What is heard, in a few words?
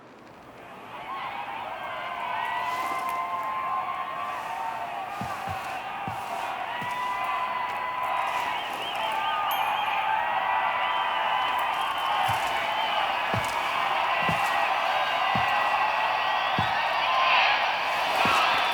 Kiss; Quincy; Warframe; Nineteen Ninety Nine; Quests; Romance NYE